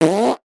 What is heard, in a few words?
human blow raspberry